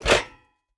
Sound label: footstep burlap metal jump land; Rust